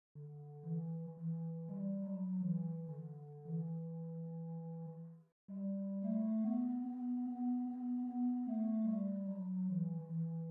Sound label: Adsum Domine alto